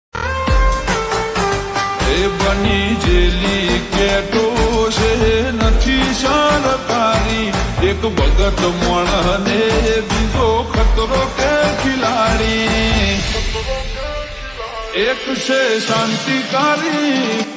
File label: Slow Reverb Version Ringtone